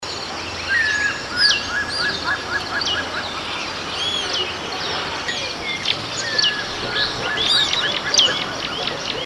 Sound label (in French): autour unibande, chant